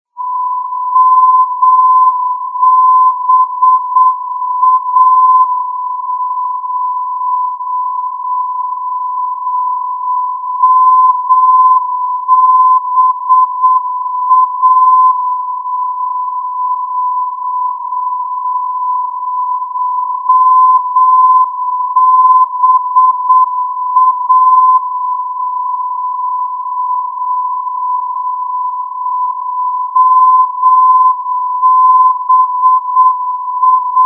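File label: ndb, non-directional beacon; mansbach mba-289; rainer brannolte, d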